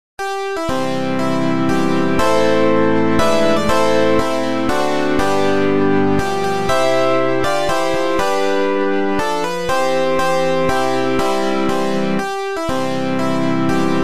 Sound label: national anthem of the united states